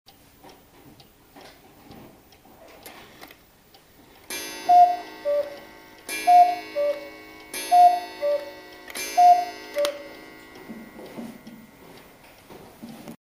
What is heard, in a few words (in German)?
KuckucksUhr Sound